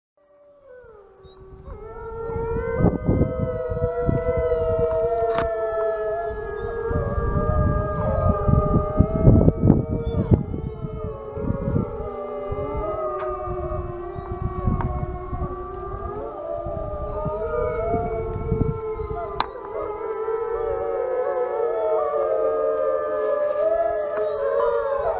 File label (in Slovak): vlk